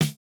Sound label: no effect j city pop 1980 snare